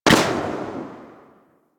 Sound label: Shot Tail; Warframe; Afuris